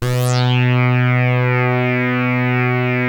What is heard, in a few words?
SAW RES WET, SYNTH LAYER, Synth and Organ; InVision Interactive; Keith Emerson